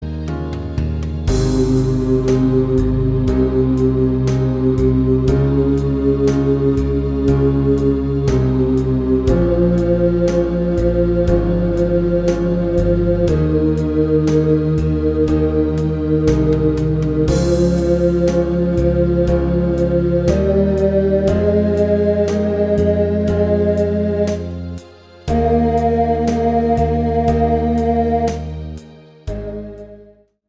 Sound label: bass sample, backing tracks